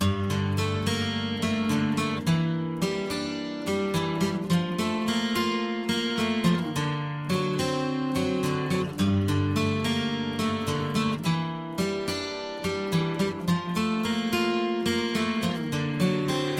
Romantic Guitar Ringtone

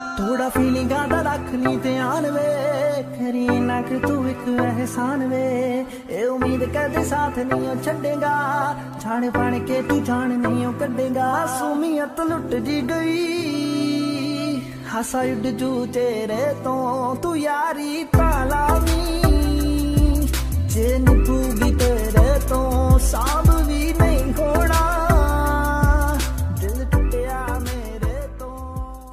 Punjabi Love Song Ringtone

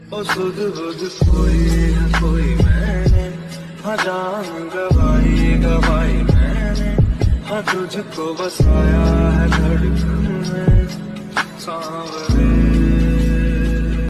Movie Song Ringtone

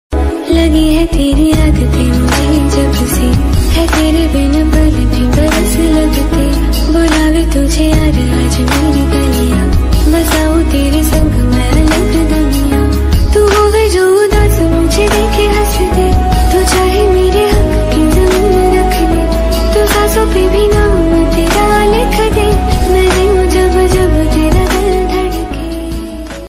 Female Version Ringtone

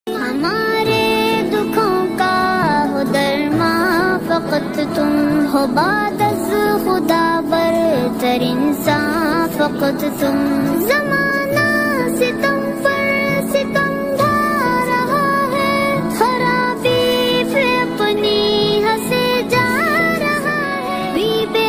Naat Ringtone

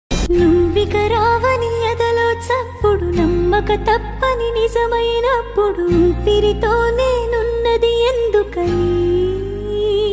Female Song Ringtone

